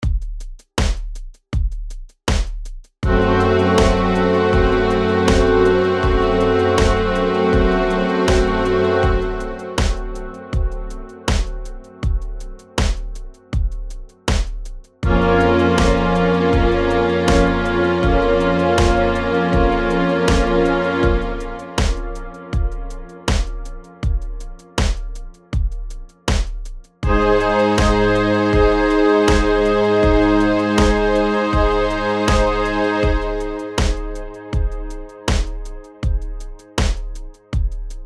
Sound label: chord quality id; harmony